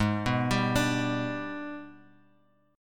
Minor Major 7th Flat 5th, Guitar, Open G; Gsharp; 6,4,5,7,x,x

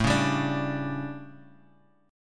A 11th Guitar Half Step Down

